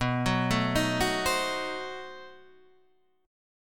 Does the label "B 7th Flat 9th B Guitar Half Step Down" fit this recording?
Yes